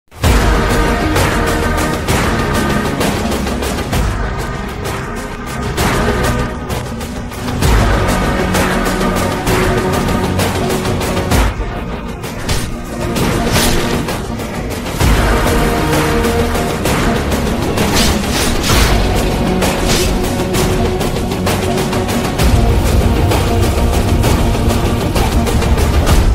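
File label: background music ringtone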